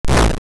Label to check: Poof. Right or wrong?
Right